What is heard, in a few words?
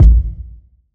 ambient kick drum a key, kick drum tuned to the A note. Loudest frequency: 74Hz